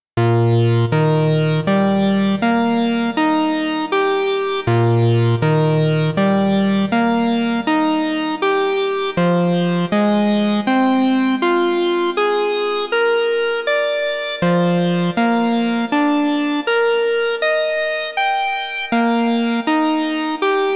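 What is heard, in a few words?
sequence tic tac; the transmitter soundset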